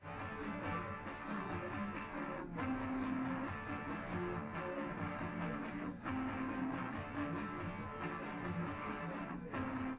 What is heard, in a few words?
wdrums liverec; underdetermined; SiSEC11